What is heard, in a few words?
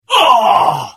Hit; The Elder Scrolls III - Morrowind